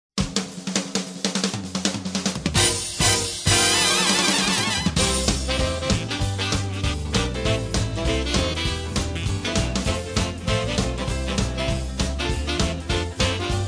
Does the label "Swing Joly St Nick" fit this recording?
Yes